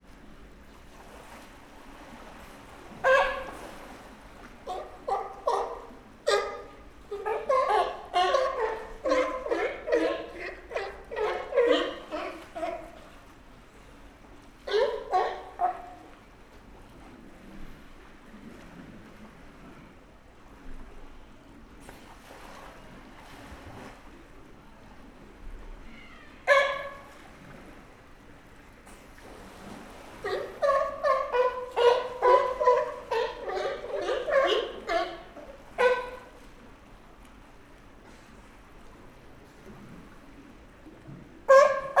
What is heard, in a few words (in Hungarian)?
uszas kozben beszelgetnek, kaliforniai oroszlanfoka; állathangok; nyíregyházi zoo 2010